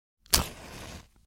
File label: Match Strike & Ignite, Household